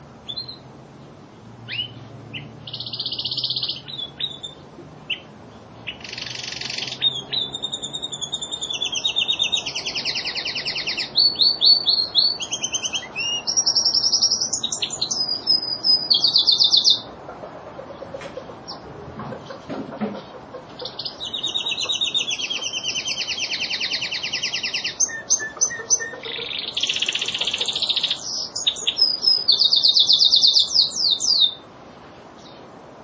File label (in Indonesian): kenari lizard